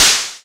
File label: NOIS; E-MU Formula 4000 Series Vol. 2; Dance Hits